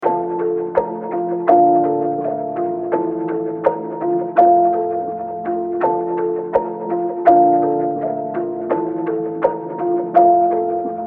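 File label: D minor 166 BPM Piano Keys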